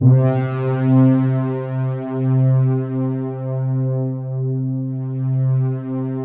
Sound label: KT76 BRA, synbrass; Synthesizers